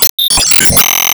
Team Skill Tap

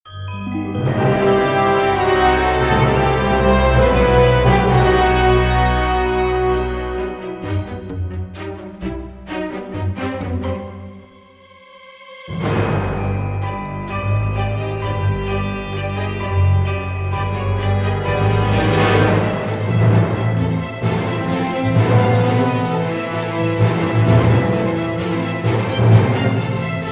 Rocket Flight; Epic Mickey; Wii; Rocket Flight (Alternate)

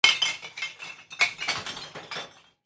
Ambience Trash; Subway Surfers City; Android, iOS

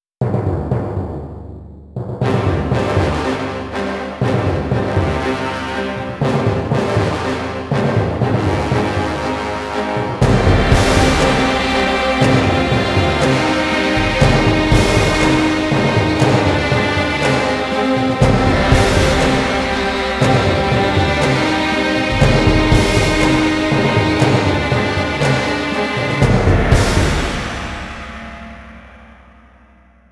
Unknow Jingle; Unknow Jingle Type 5

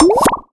UI Menu Confirm In; Skylanders Cloud Patrol; Arcade, iOS, Mobile

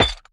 Bounce Metal

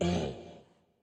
Hurt; Dinkubus

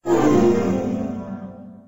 Game Over; major mayhem; (Android, iOS) (gamerip) (2011)

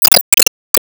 Enemy Iceberg Crystal Delete

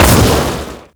Shoot; HotHead

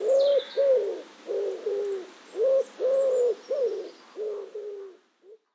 Ambience Menu Pigeons; subway surfers city sound effects

